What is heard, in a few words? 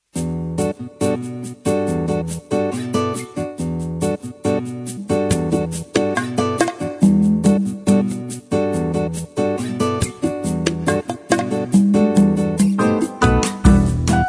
bossa nova